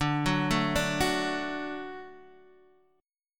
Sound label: D Major D down Guitar Half Step Down